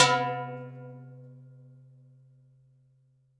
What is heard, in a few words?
low bell; Univers Sons - Raricussions